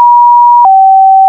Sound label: siren